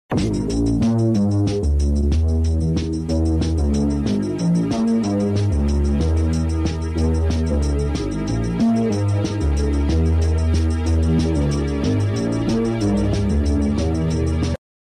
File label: dark synth pop